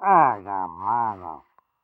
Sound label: negative vocalization; Pyro